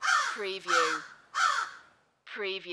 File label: Crow Caw Multiple Short